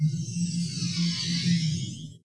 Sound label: replicator; cardassian